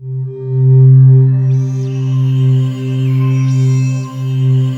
WS NL C 3-L, Korg Wavestation; Back In Time Records; Korg Universe VOL-1

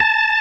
STOPS, ORGAN; InVision Interactive; Keith Emerson; ORGAN SPLIT

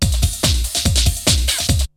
BREAKBEATS, TECHNO

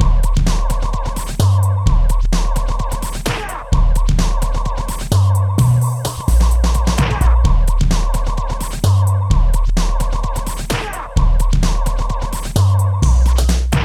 Beat, Drums & Percussion; Electrocution; Beats

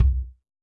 Ludwig Kick, EMU PX-7 Drum Machines

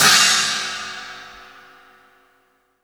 sfx perc

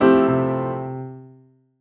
piano stab

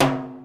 Lo W Verb, Alesis D4 drum machine

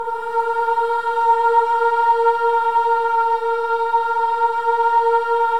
BOYS AHS, Symphony of Voices

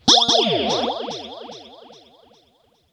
RIPPLES L, Funk Guitar; 90 s Sample CDs; Zero G

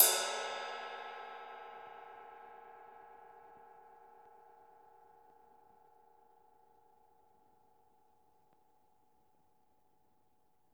RIDE, Drums Percussion

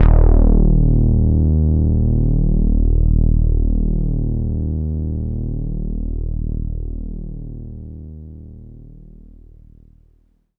Polytaur d#2, Korg Poly-800

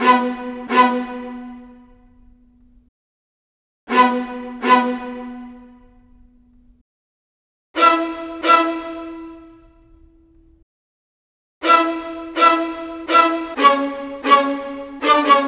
Strings Stab; Sonic Foundry (Sony Creative Software); Club Joints and Street Anthems; Game Time

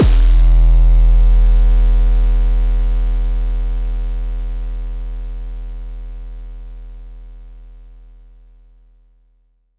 boom, Kicks; ModelCycles

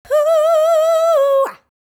"Whohoa", vocal phrases; Ultimate Vocals

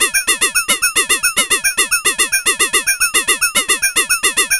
ARP2600; AMG - Vince Clarke Lucky Bastard

